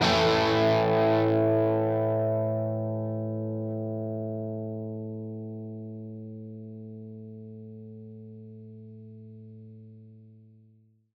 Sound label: Guitar single power chord A minor; remi